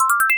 Weapons Upgrade; XDR X Dazedly Ray; Genesis

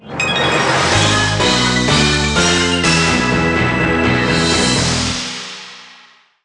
(Jingle) Trade End; market crashers 3ds gamerip 2016

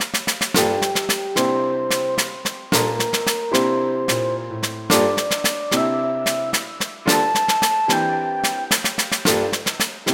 fanfare faster; Healers Quest

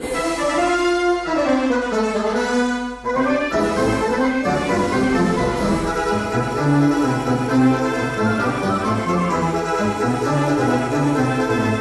Paso Doble; Torero Arte y Pasion en la Arena

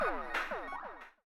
Arcade AMB Outside END

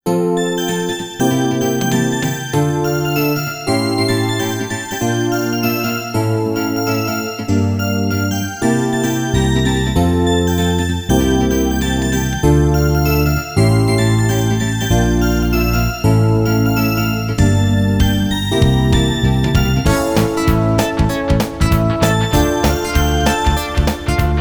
Synth Version; Tentama Sound Collection Alpha; Touminshinai Kuma-san

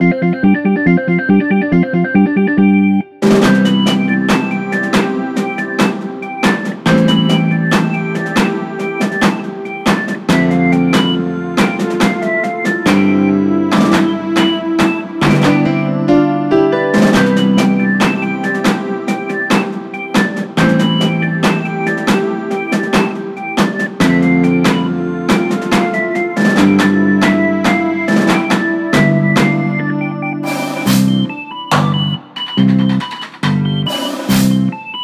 BGM Camera Man; tamagotchi no doki doki dream omisecchi 3ds

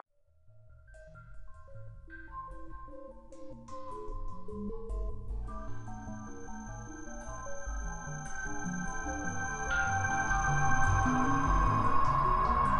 sounds music; Halo Combat Evolved; Xbox

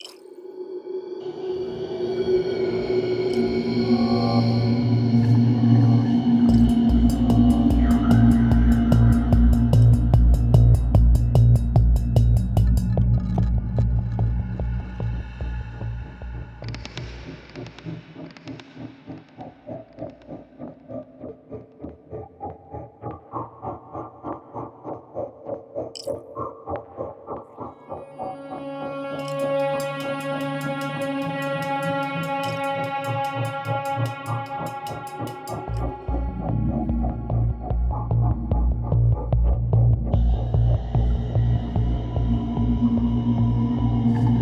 74BPM; ForestRepairTech; Doctor Who; The Edge of Time

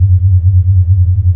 Pickup Idle

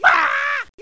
No Squeak

